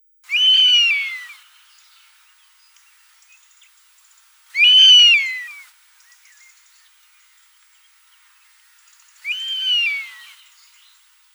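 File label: European honey buzzard; Osoed obyknovennyj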